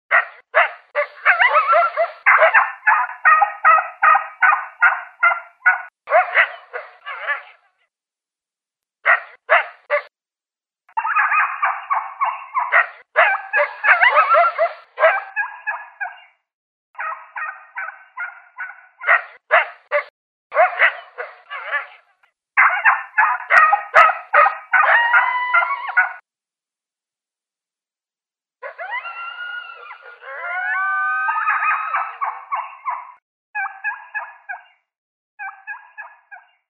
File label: Coyote & Turkey Distress